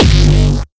Kick Dist Soo; Granular Sumo

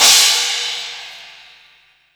Crash Ozone Long

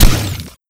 Sound FX Scifi Drum Kick

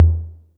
tom; foxdot default

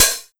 Hi Hat Open

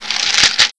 Perc Shekere Fidget; pitchglitch

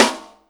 Snare Soft

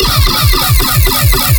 SoundFX Space

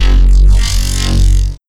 Bass Low Laser

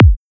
Kick Hard

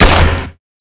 Sound FX Scifi Drum Snare Shot

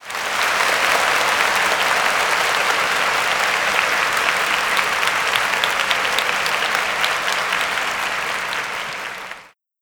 Clapping Crowd; Pyrex

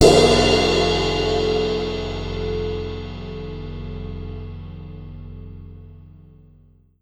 Crash Long; Forzee; pitchglitch

